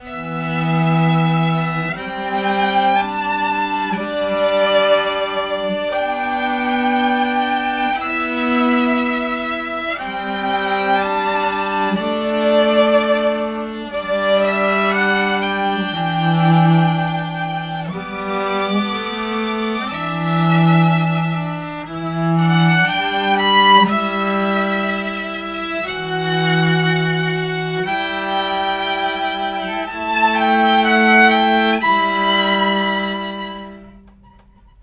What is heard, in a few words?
string room mics legato